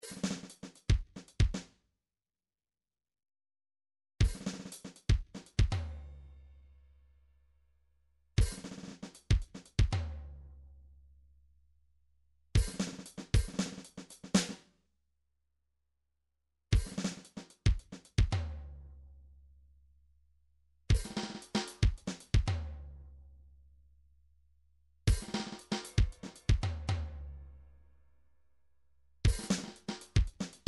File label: drums 115 fill; Paul Kodish Dangerous Drums